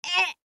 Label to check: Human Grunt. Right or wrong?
Right